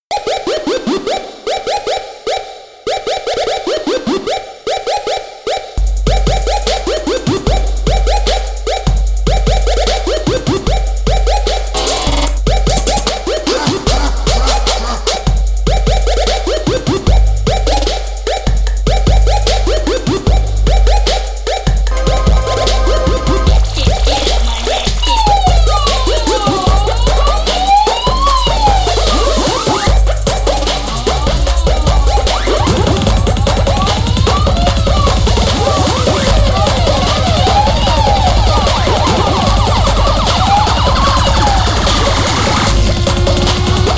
lazy trap; shovelwares; weeklybeats-2016

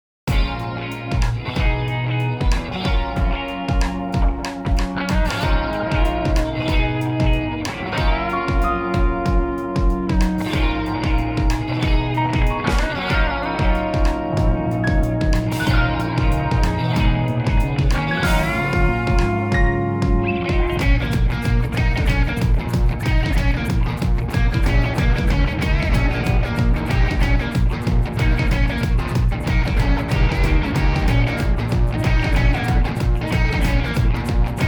jazz noise; weeklybeats 2024

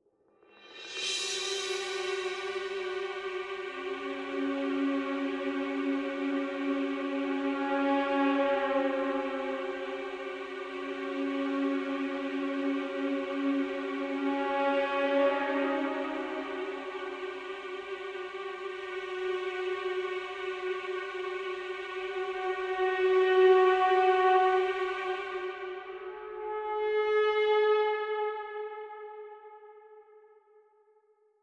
brass bends; only the young die good; weeklybeats 2012

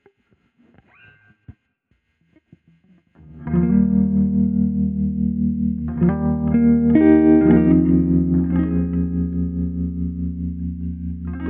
chilled noodles

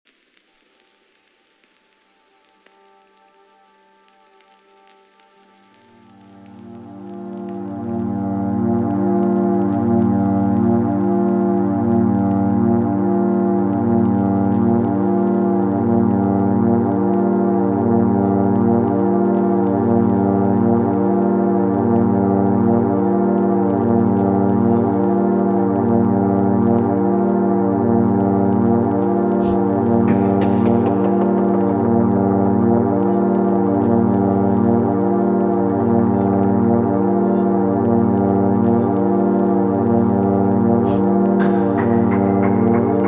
drone in eb; weeklybeats 2026

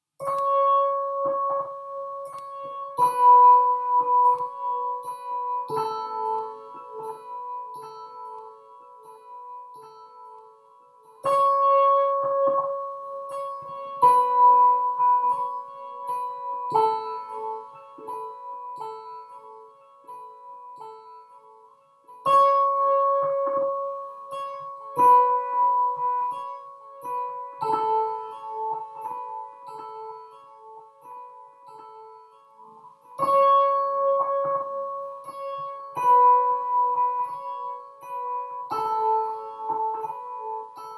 electric guitar modular synth; weeklybeats-2018

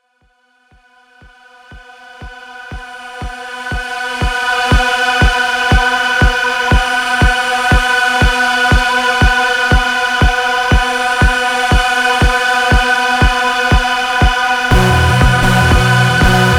microfreak jam; weeklybeats-2020

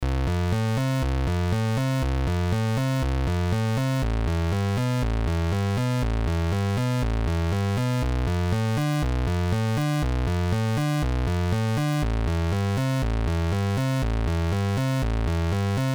game over music; weeklybeats 2012

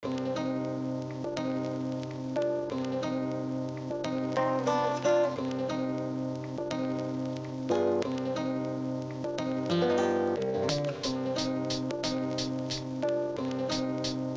gutter rap oldhead type beat; weeklybeats 2024

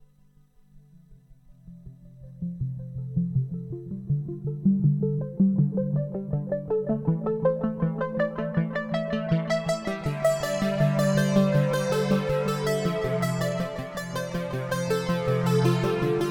synthetic waves logue duo with volca fm; weeklybeats-2020

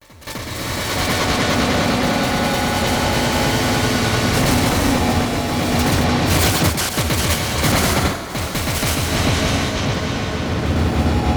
chordal clouds warble; lsdj; glitch

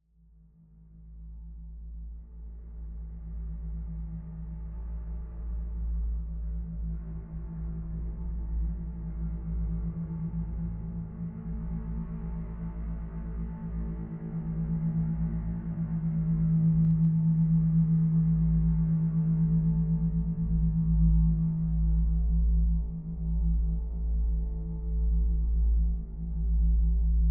soundscape jam; weeklybeats 2014

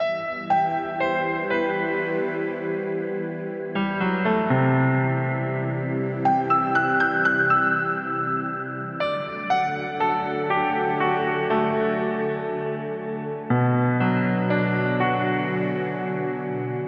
9 8 g 60bpm; spiritual healing; weeklybeats 2014

